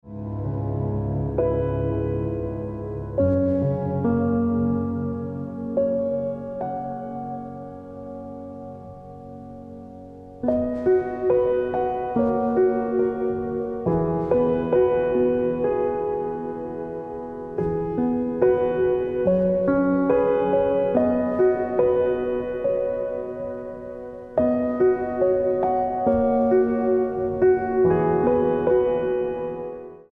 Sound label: Ringtone